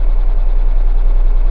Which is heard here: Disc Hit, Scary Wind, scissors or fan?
fan